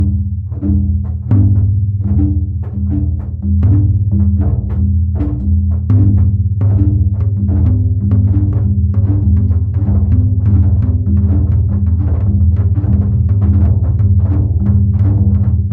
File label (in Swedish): Trumma till Reklam